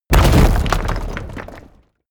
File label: break stone medium